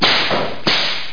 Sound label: whip